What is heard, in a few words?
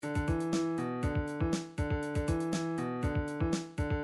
funk, midi